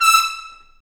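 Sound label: BRS TP STA, brass trumpet stabs; Roland L-CD702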